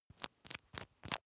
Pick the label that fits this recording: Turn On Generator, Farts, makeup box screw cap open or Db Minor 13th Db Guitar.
makeup box screw cap open